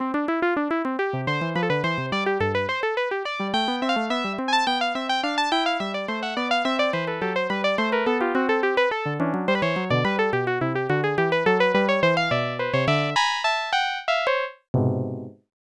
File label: Piano; doukyusei ibm pc